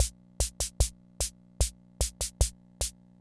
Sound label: Kent K-220 Rhythm System Loop, drum machine